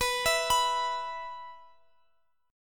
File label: E 5th B